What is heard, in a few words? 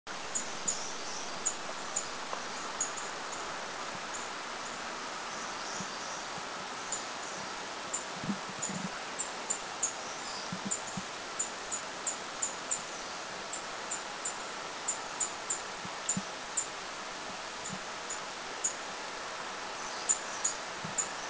Buff-barred Warbler Soft Calls, Thailand; Doi Inthanon